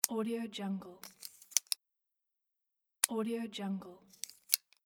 folding knife open close

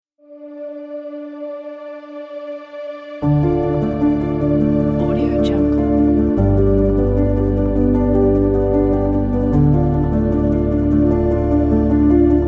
in documentary

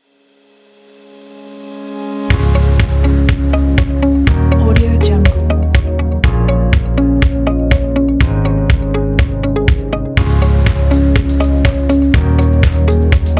uplifting simple dynamic

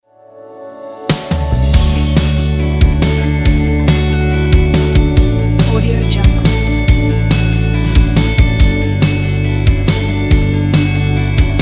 uplifting teen rock

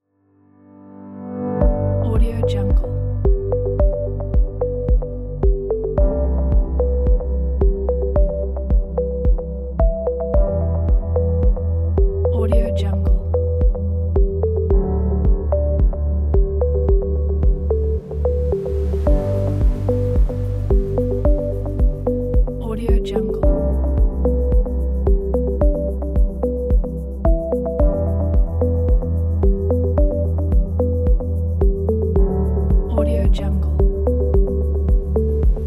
background ambient; songcake

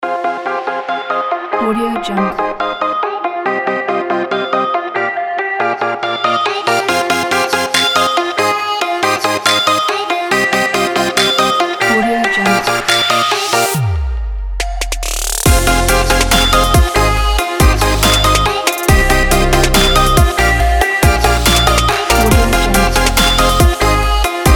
the future bass kit

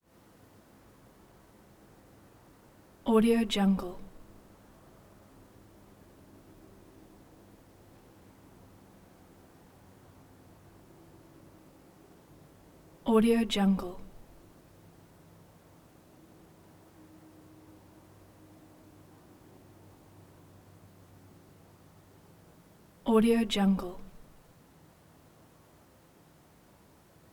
living room presence late night no crickets

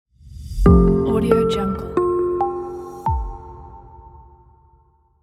trend logo

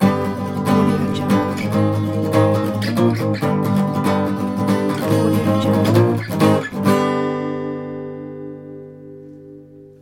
spanish bullfight intro; urbazon

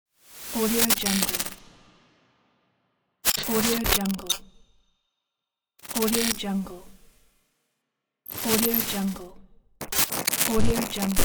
distortion glitch

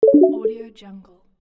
disconnect

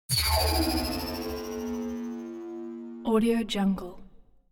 sci fi energy field vanish

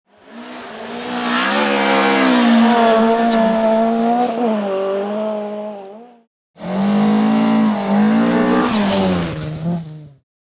passing car; teldrassil

